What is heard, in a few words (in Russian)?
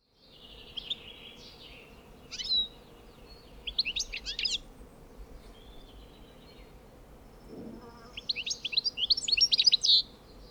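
chernogolovyy schegol golos, goldfinch voice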